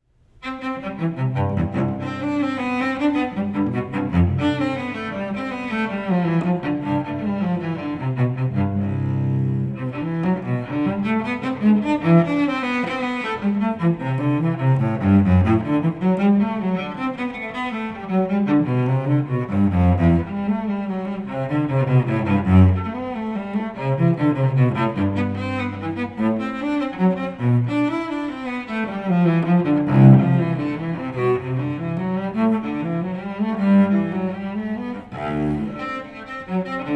courante; suite no3

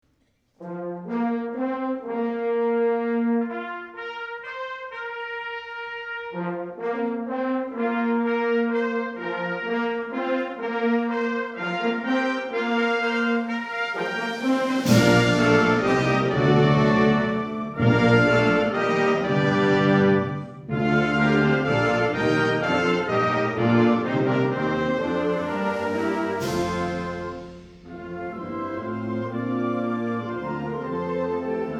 flourish for wind

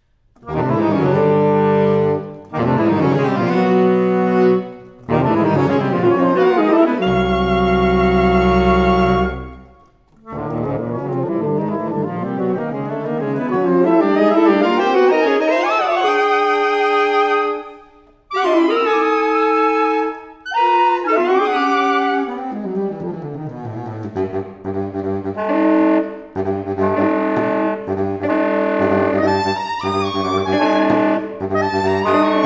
speed metal organ and blues

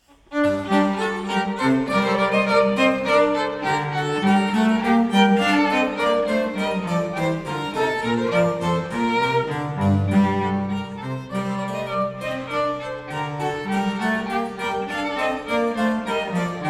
bourree

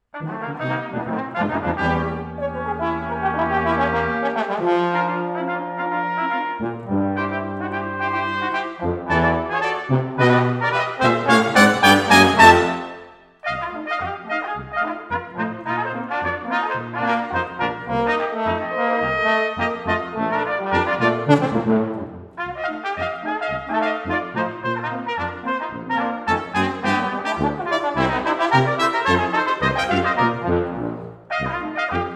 ragtime

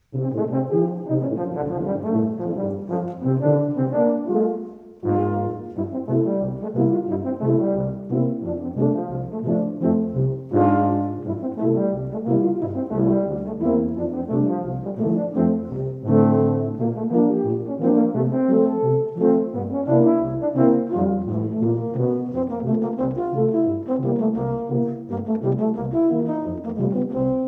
tubalatron rag

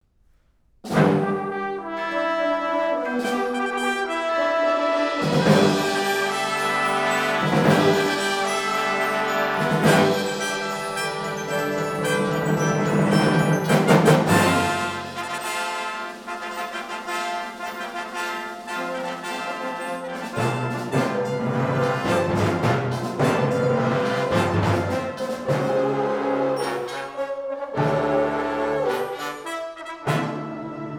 fanfare

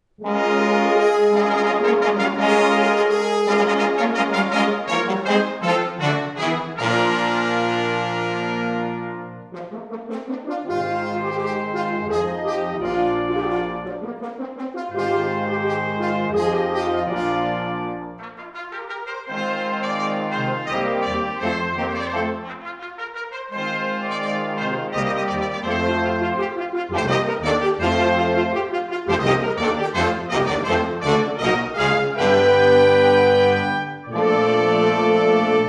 fanfare; fanfare pour preceder la peri

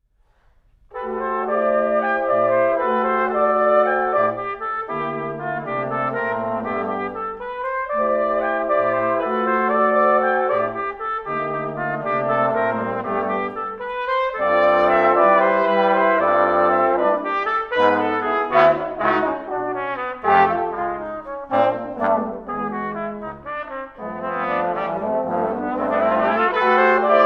quintet for brass

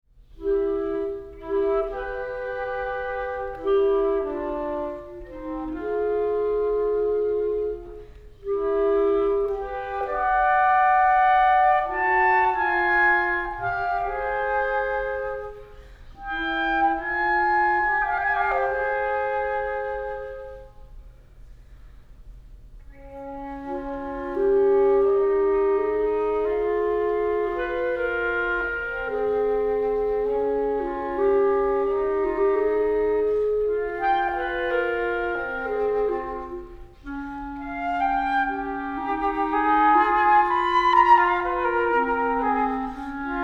duos for flute and clarinet, andante molto

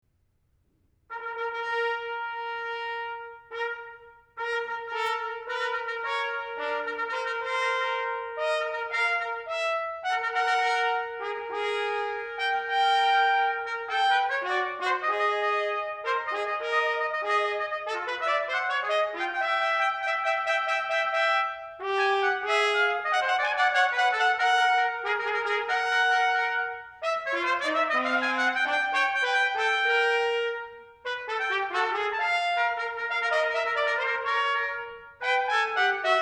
fanfare for a new theatre; stravinsky

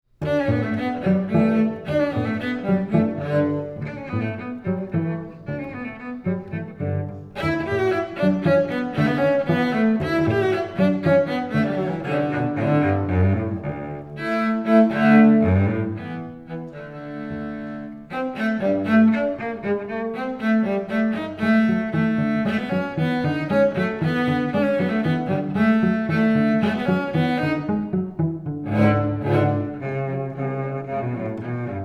five duos for two cellos allegro

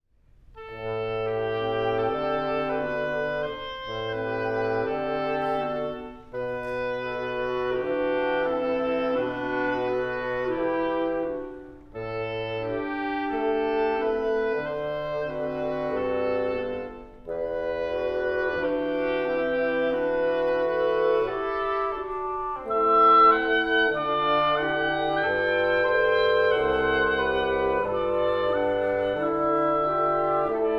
quatuor andante

